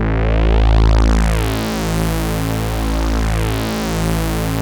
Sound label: OB A2 -R, Rare Synthesizer; Hollywood Edge - Giorgio Moroder Rare Synthesizer Collection; OB 4 VOX.